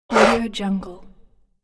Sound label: wood game piece slide